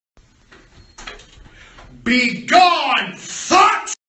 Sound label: reee